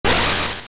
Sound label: Whirlwind; Jump into a whirlwind